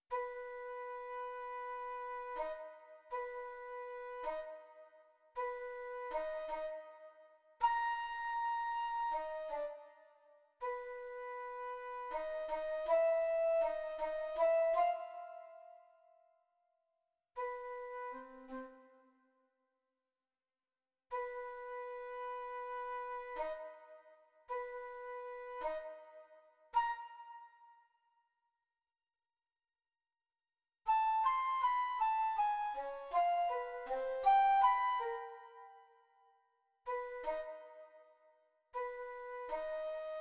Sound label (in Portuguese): flauta solo